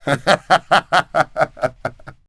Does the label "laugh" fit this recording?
Yes